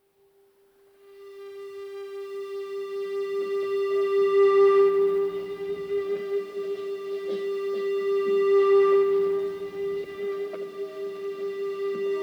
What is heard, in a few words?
Loop III